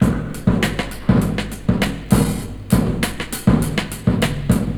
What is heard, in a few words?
100 bpm high quality drum groove g sharp key